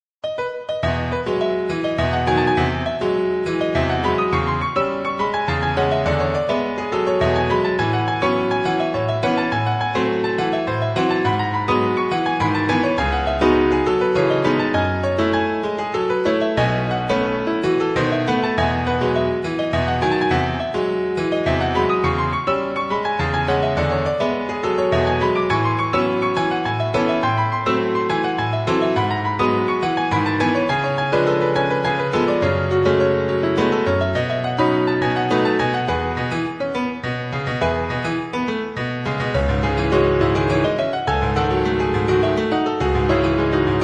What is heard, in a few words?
brazil waltz